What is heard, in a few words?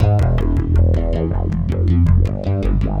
Sequence Bass, Drum N Bass; Sonic Foundry (Sony Creative Software); Knutrix Drum & Bass